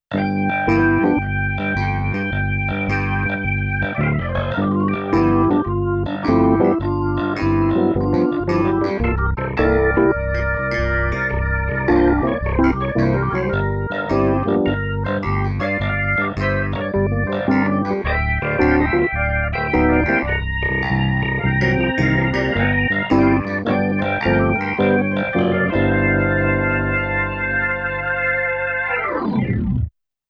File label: keys of 60s 70s; xp-80; roland; Multi-patch demo; roland demo cd-3